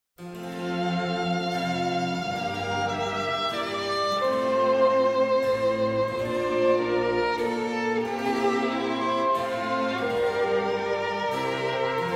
Concerto For 2 Violins in D Minor, Largo ma non tanto; BWV 1043